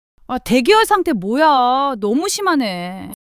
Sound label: surprise